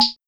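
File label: Conga Mid, Korg SR-120; Drums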